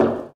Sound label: bleacher step; Golden Crayon Sounds